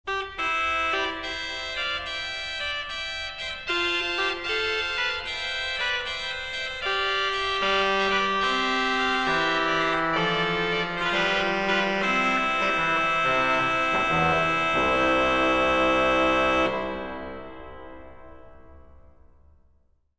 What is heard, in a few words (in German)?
spanische trompete, orgel